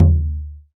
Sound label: Wadon, Roland BK-5 Percussion